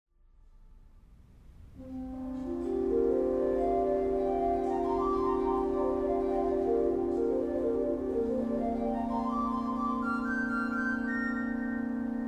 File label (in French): Interlude orgue